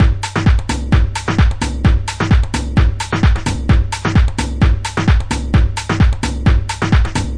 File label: brazil house, drum loops and breaks, 130 bpm